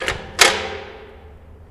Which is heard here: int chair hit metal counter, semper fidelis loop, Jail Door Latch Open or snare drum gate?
Jail Door Latch Open